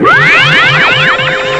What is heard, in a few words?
scal stun; vortex; xquake